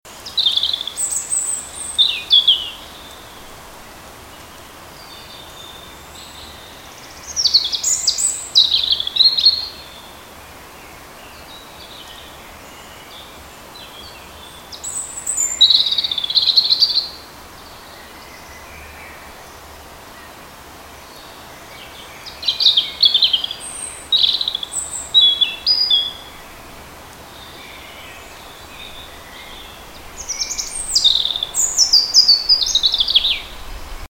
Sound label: erithacus rubecula; vogel; hecke; biologie